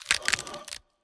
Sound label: undead ready; Shelter